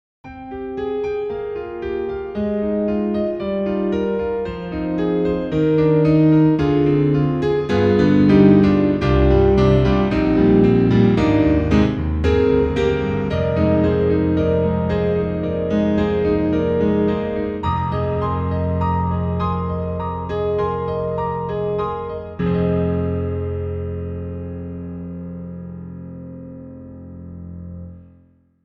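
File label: CFX CONCERT GRAND, Contemporary, piano; GARRITAN; Big and Boid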